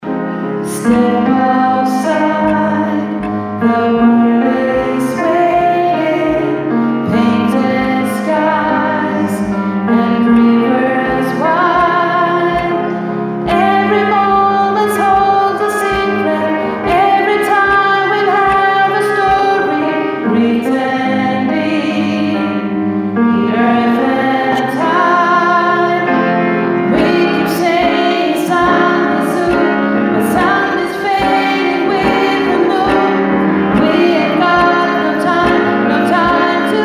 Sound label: tenor